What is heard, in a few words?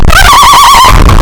bird